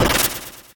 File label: Shhhhh; Undertale